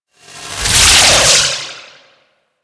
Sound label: Beam Dissolve; Warframe; Captain Vor